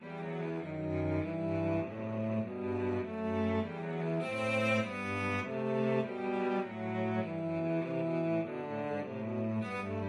Minuet VLC duet, cello duet; arne

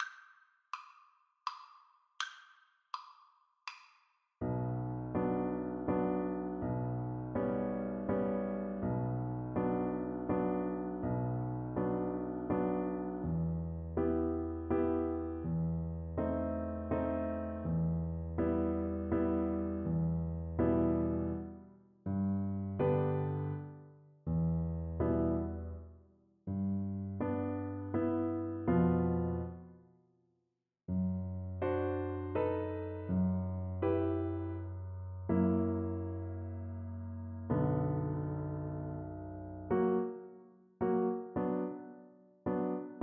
waltz, cello

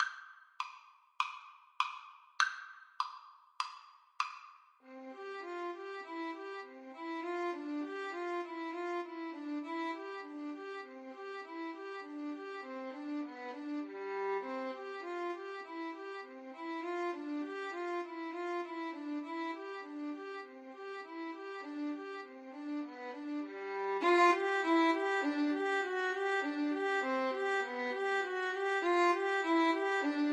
schumann melody violin duet

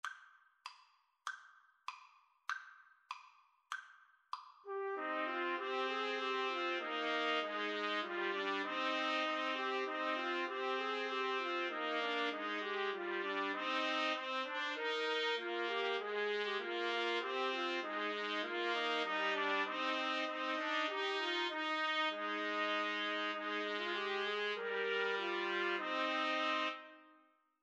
huron carol, Trumpet Trio

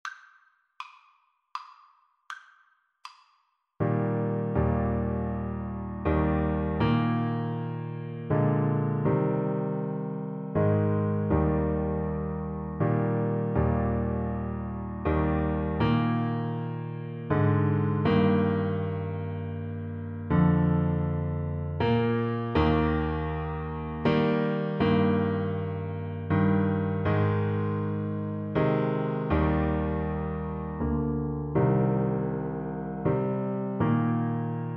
amazing grace, Baritone Saxophone

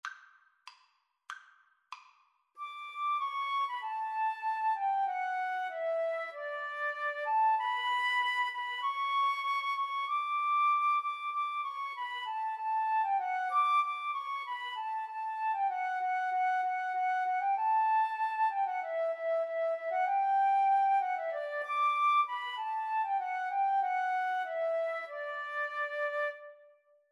Flute duet

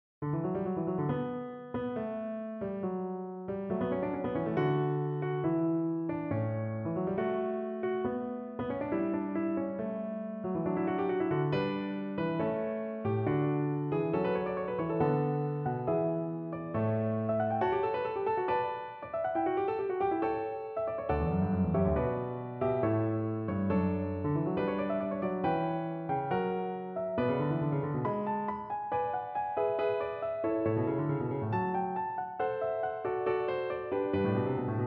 Bach Fugue, piano

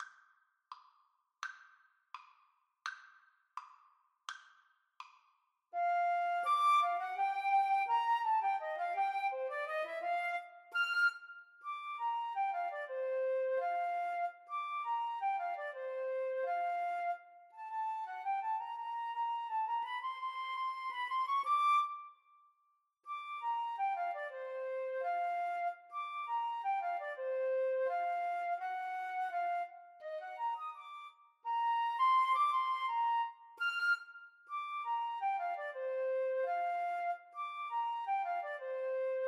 Flute Duet

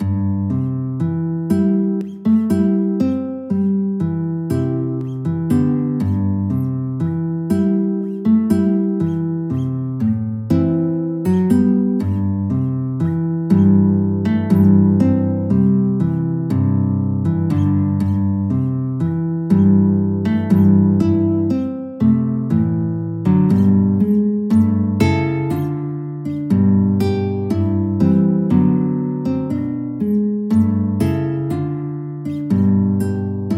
melody, guitar; schumann

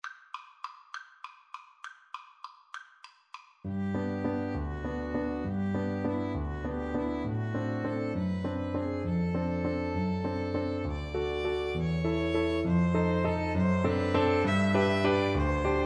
Piano Trio

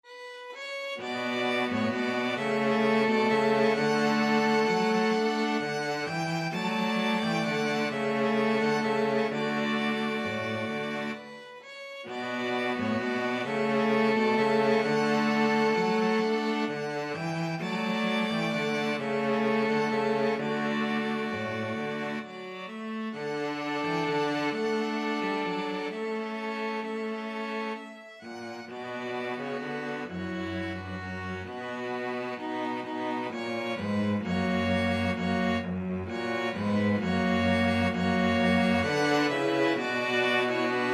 string quartet

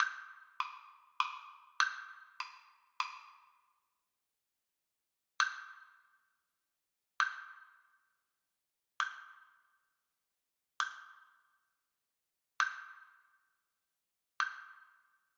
Trombone trio

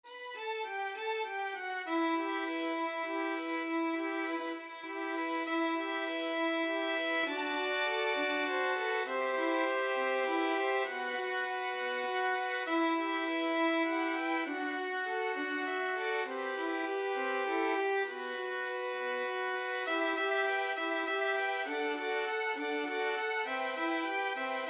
spanish violin, violin ensemble

